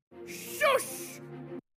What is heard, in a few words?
"fusssss"